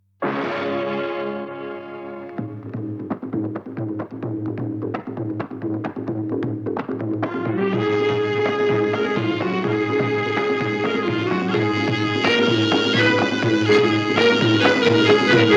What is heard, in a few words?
Title Music